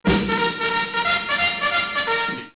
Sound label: bugle charge; cfclient